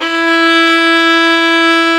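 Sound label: E4, strings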